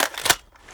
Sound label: famas clip in